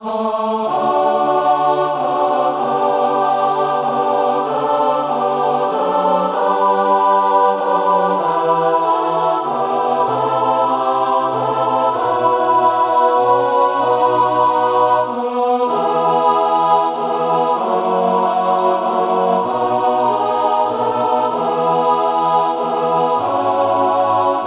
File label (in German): gemischter Chor vierstimmig